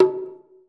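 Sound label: conga medium; Cuba; AKAI S6000 CD-ROM - Volume 5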